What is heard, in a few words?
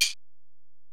Ac Perc, Drum Machines Alesis DM-PRO